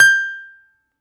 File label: BALALAIKA, Russian Strings